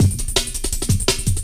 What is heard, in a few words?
Drum Loops, Jungle